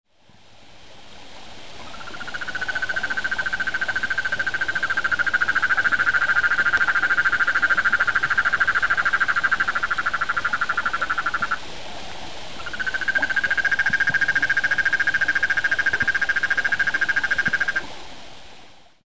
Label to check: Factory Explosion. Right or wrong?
Wrong